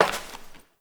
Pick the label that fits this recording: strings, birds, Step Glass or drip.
Step Glass